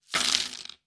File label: coins table